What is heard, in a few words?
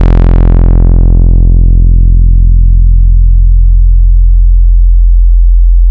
FM Feedback, Vector Sprite; Ableton Live 12 Lite; Sprites